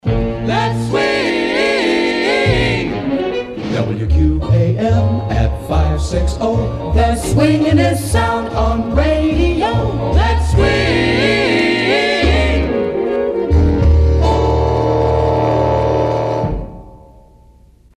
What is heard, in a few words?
Let's Swing; WQAM; JingleMill